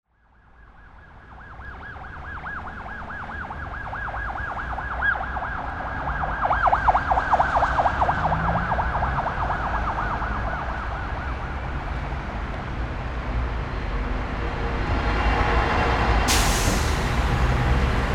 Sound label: cityscapes and streets sound fx